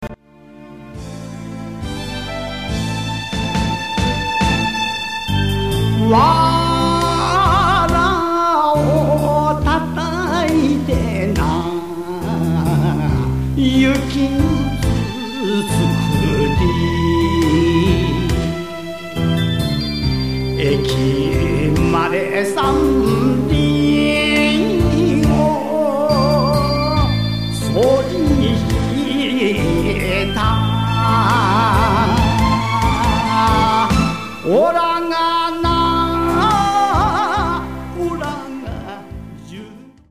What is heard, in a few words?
Enka